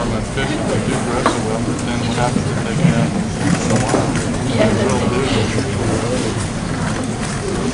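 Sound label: BOARD ROOM, Crowd; 1000fx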